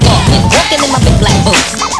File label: boot clap break, breakcore